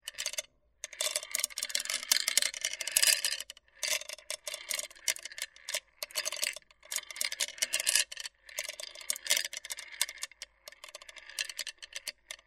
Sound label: water music